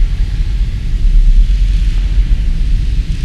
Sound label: cryo loop verb; Quake 4 Alpha; ambience cryo